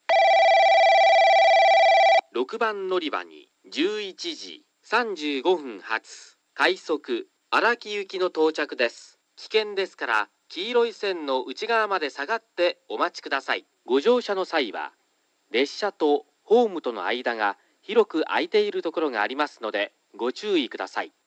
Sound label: tosu rapid araki, portable recording; 6番のりば接近放送（快速 荒木行き）; 鳥栖